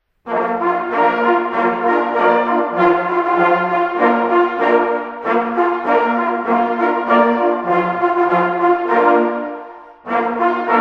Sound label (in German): marsch, Jagdhorn